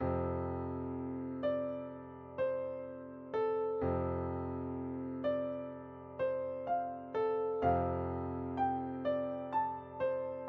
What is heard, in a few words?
frog song healing intermediate